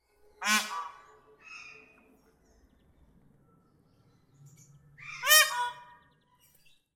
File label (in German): Blauer Pfau Pavo cristatus Rufvarianten; Vogelstimmen